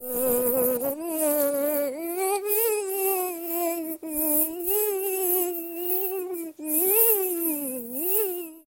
am thanh cua mot con ong hoat hinh dang vo ve mot bai hat va vo ve

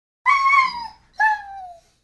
co nguoi giam phai chan cho

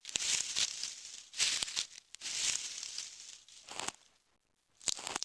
Am thanh tieng Luc loi Tim kiem

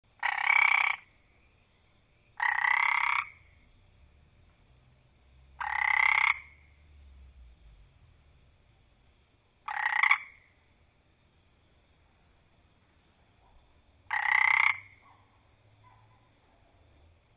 tieng ech keu hay

